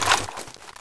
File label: displacer draw, weapons